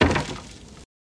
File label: Dirt Throw On Coffin